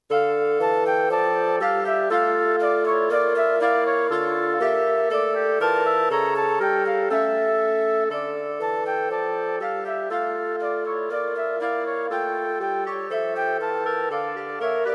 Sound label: Wind Quartet; Wind Quartet for Concert performance; It is a parody of the Baroque style.; Flute, Oboe, Clarinet and Bass